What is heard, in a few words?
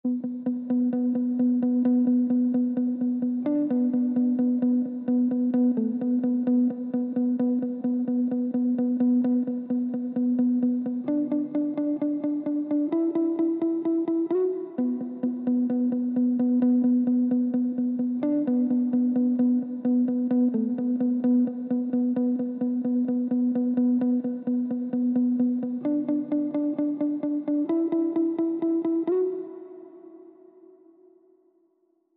130 BPM D Maj Pluck, Guitar; Melodies; Loop Stems; Cymatics; GHOST (Beta Pack)